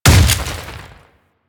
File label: Ice Proj Hit; Warframe; Corpus; Arachnoid Camper Terra; Abilities